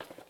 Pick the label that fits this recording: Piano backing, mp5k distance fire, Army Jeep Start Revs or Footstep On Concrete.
Footstep On Concrete